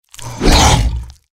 deathclaw attack; npc

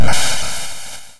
nes zap, zapper; weapons; neszapper

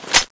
clip in; cso; x-12

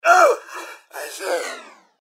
generic robot death; mrhandy; npc

